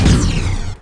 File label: Gatling